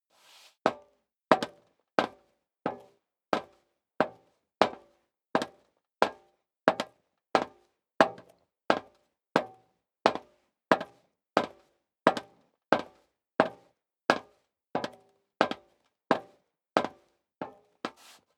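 step leather shoes metal dry walk sequence, footsteps; schritte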